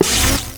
attach orb; Pulse Racer

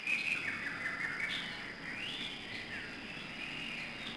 jungle; Bali; Splashdown; XBOX; Soultree

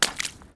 Small Splash

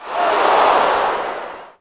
Ooh, Crowd, reactions

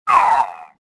pain; swamp

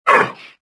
pain; galak

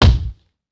hit

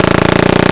mall gun; Chase Hollywood Stunt Driver; XBOX

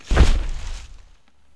Large walk, Dino

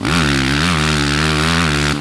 Power, Engine; 450

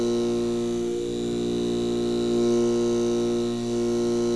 light hum; Star Wars Knights Of The Old Republic II; XBOX

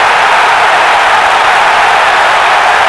Large Crowd Cheer; David Beckham Soccer; XBOX

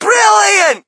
crowd, shout; Mike Tyson Heavyweight Boxing

